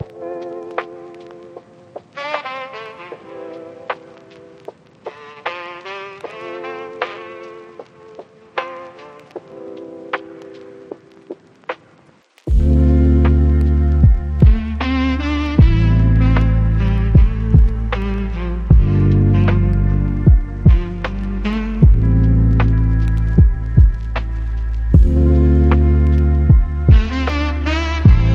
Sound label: Melancholic Lofi Hip Hop